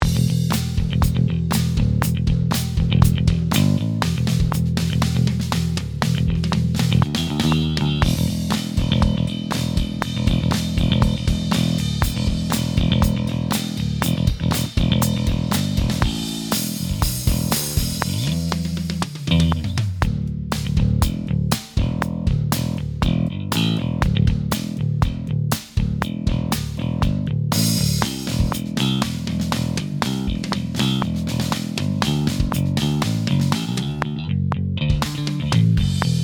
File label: Rock Bass amp